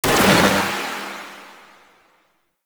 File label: Detron Mech, Weapons; Warframe; Void Trader